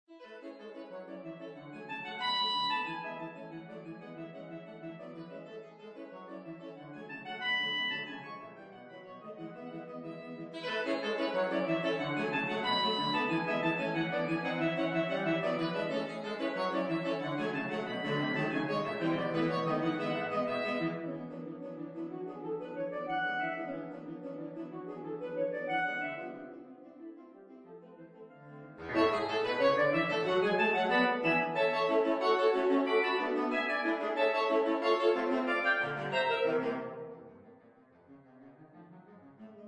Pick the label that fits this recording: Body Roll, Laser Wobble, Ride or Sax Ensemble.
Sax Ensemble